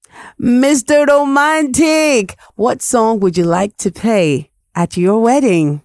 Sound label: Wedding Song Interlude